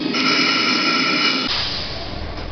puff

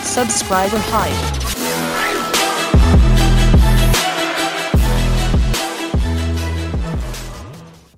sub alert

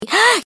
gasp

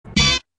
hiphop

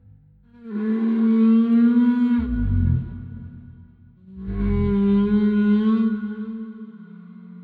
raven call

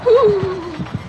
stun sound; dr miss

